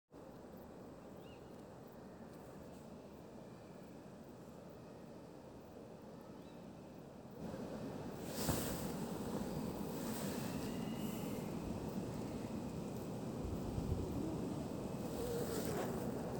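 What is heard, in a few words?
just noise recorded during a walk in the forest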